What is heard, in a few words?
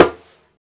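perc conga, deep congas; Roland LCDP14 Africa VOL-2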